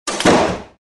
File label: slapback